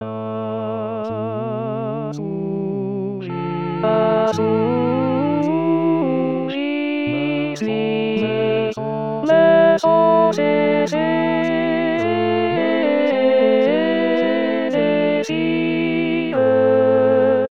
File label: alto; rimailleurs